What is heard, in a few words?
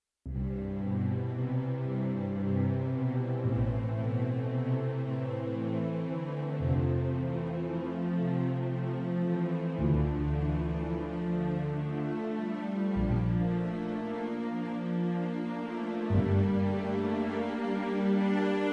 melody ww summary backing dry